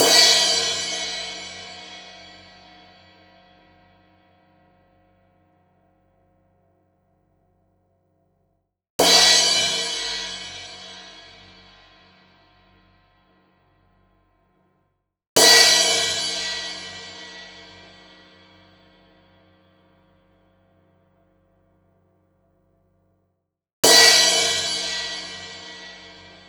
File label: Crash Dark 4 Speeds